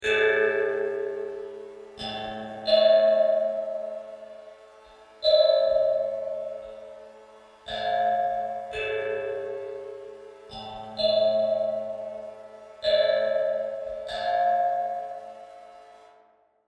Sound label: Dinner Bell; Griswald; Appliance